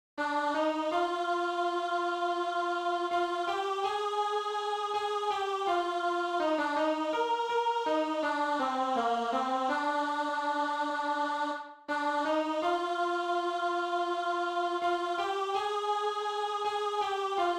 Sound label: Dream alt